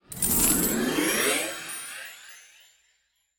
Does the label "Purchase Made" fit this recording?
Yes